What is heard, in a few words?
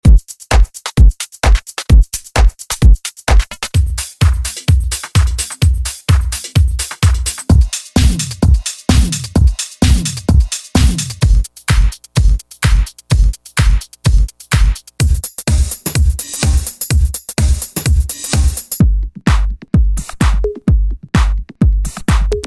Electro House; Electro House Tools Vol 1